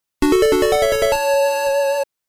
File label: Fanfare Level Up